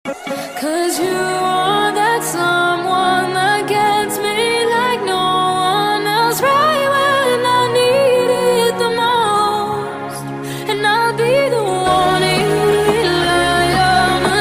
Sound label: Ringtone